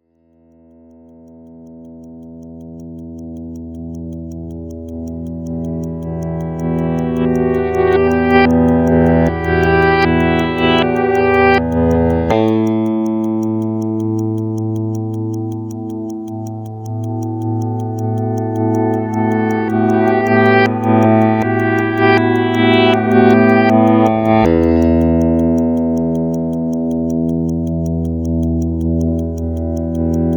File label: Reverse, Tension Mystery